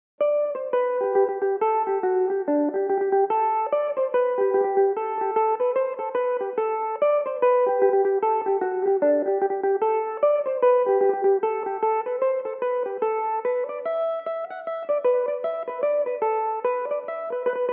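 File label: limestone rock G major, irish mandolin; Belmuse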